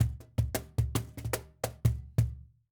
110 Bpm Fill

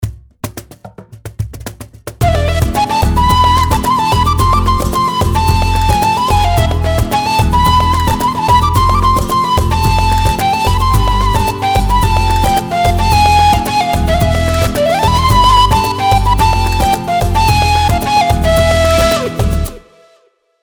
persian loop cajon

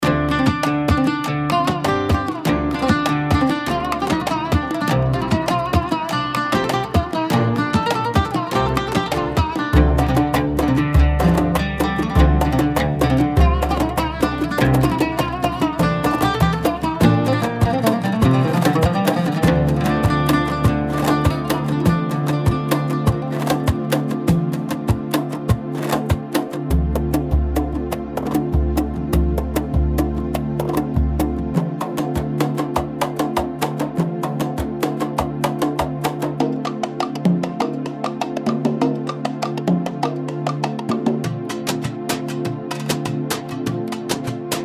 loop bandari bastaki; پکیج لوپ بستکی پرشین لوپ

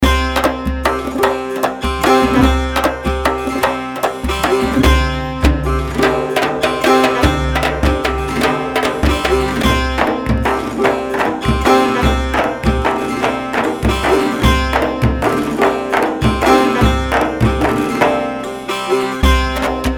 zarb

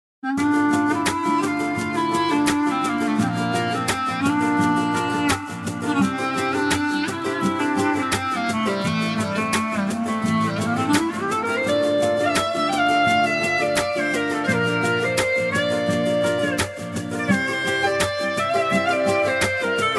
PERSIAN LOOP GUITAR

